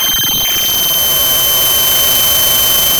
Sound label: pop, Sound of a cat meowing